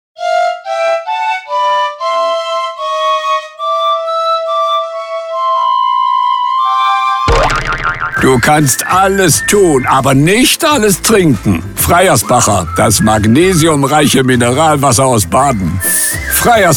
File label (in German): Flöte; Freyersbacher